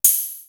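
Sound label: house hat, cymbal; East West; Global Sounds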